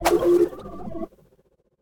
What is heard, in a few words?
Fire; Smite; god Izanami